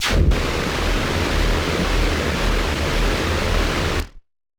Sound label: Battered Synth; malfunctioning synth samples